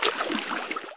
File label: swim freestyle; heretic ii demo; player